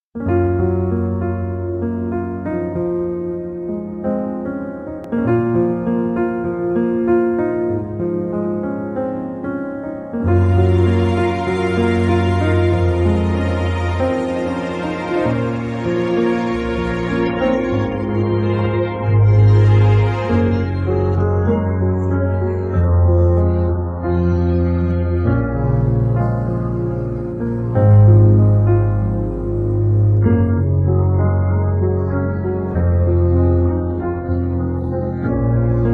karaoke минусовка

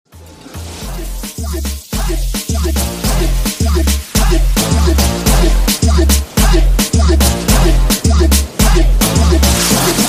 club mix

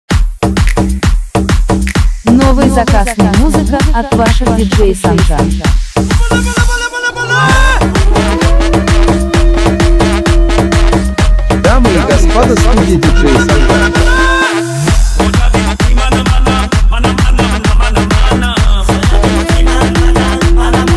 remix bass

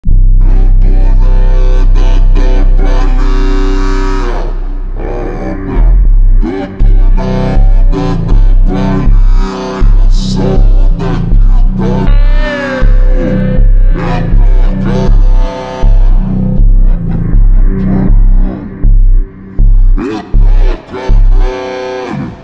Brazilian phonk; SUPER SLOWED + REVERB

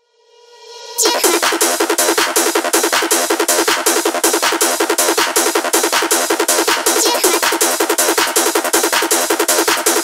techno

